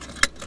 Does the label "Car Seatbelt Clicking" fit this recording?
Yes